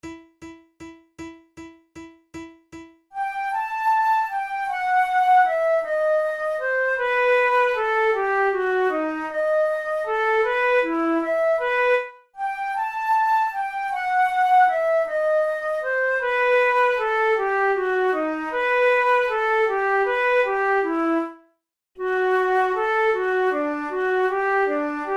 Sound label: duet in e minor; kohler op93 no1 duet no5